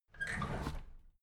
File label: drawer big squeak open heavy